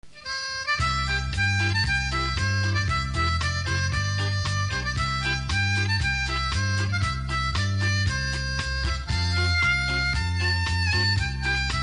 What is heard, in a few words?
oh susanna, harmonica